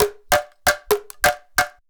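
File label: emelako grooves; Best Service; World Colours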